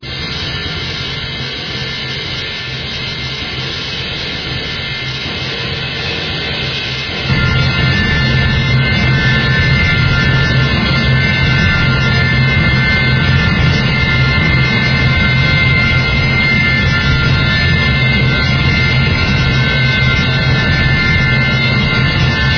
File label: Manic Percussion